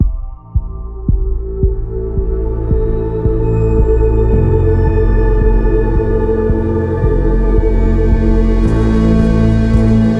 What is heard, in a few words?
Amb Day Music; Fortnitemares